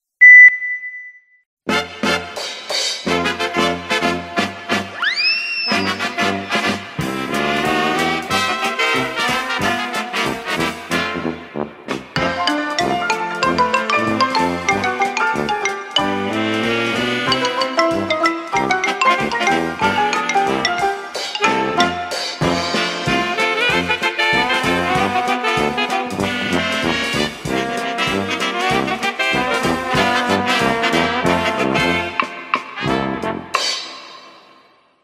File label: Routine Music; Stage 3; club championships